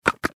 Button Switch

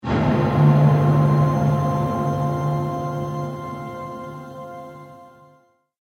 Angelic Drum Impact; Miscellaneous Impact Sound Effects

